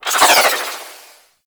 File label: Arch Wing Prime Dodge Roll Left; Warframe; Vehicles